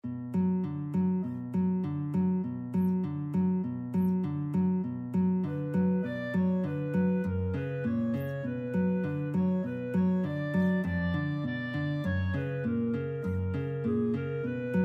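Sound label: piano clarinet guitar, guitar clarinet duet; rach pno 3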